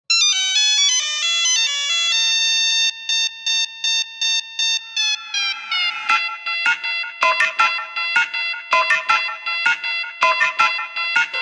hard techno remix; nokia

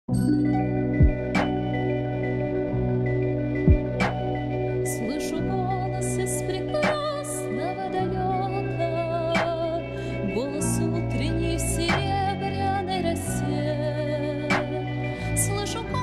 trap remix

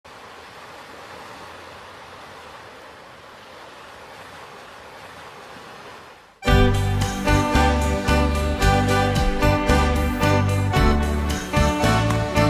instrumental; la paloma

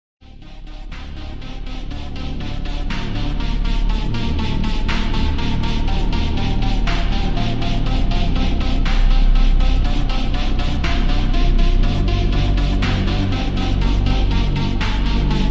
loop ultra slowed reverb

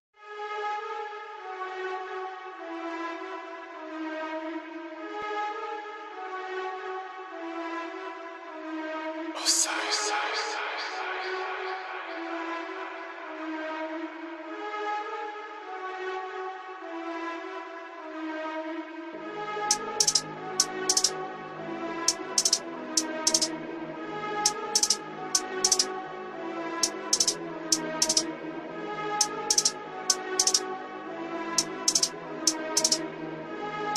slowed bass boosted

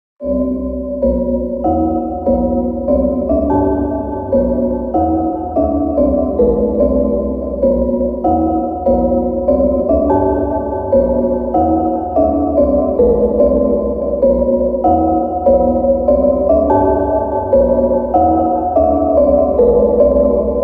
ultra slowed reverb

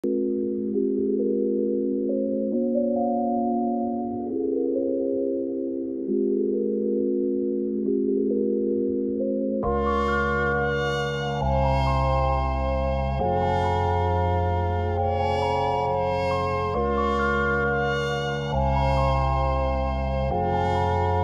violin

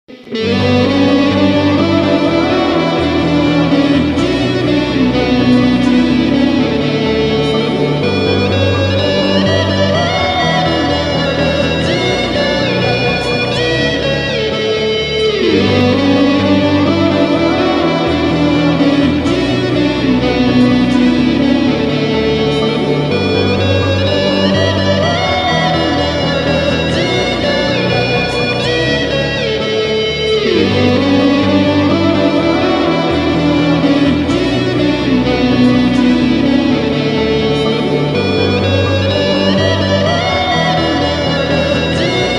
80s version cover guitar version

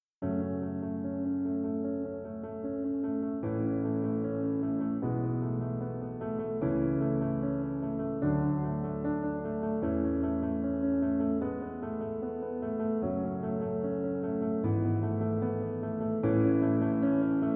piano version; Gladiator

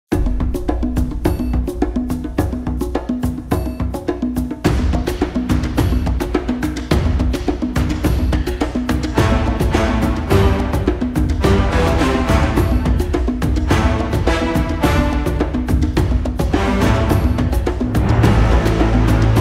epic version drums; one piece

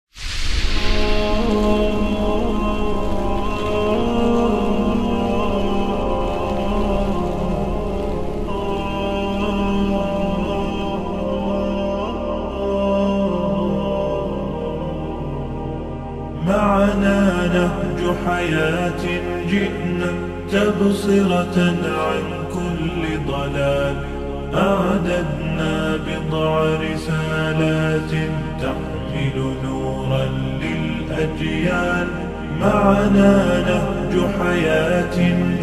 slowed reverb soothing nasheed

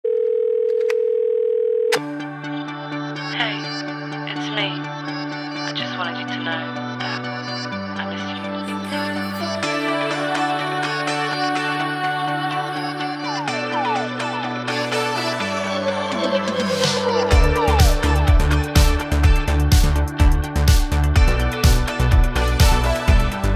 synth

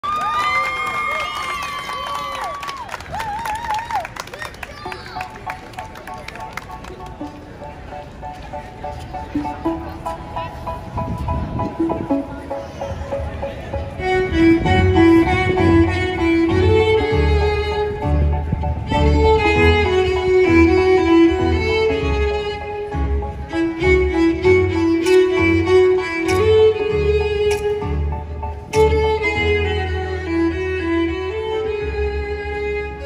street performance violin cover

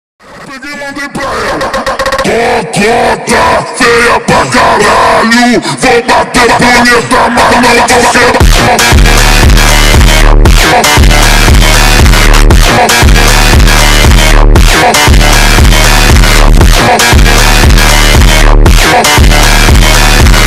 slowed

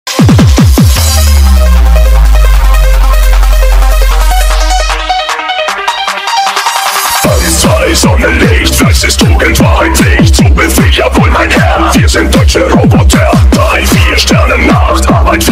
bass boosted

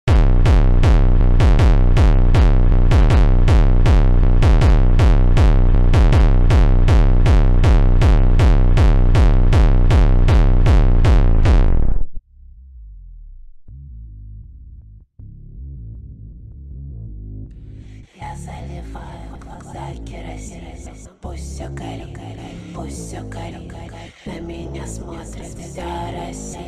hardstyle slowed remix

